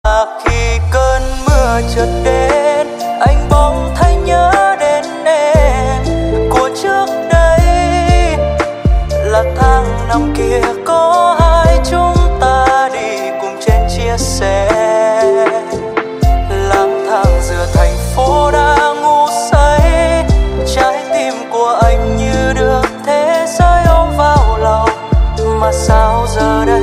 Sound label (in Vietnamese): nhac chuong lofi